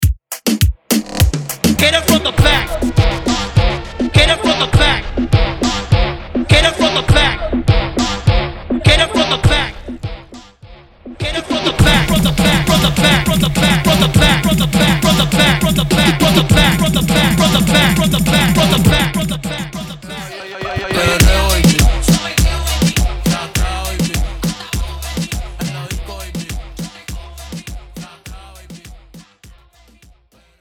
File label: Intro 102 BPM